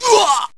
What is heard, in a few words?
uwah